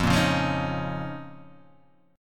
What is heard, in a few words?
F 7th Suspended 4th Sharp 5th F; F7sus4#5; strummed; JGuitar